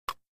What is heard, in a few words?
Fishing Reel Lock, fishing